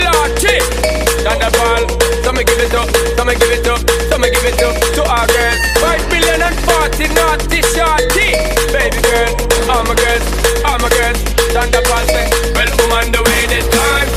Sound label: bass ringtone; fast furious 2020; english ringtones